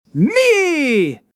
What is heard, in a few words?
meeeee